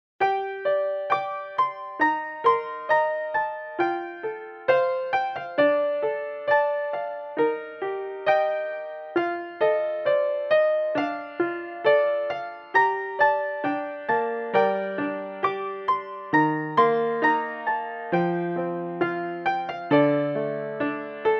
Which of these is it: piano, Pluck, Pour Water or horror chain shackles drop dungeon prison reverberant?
piano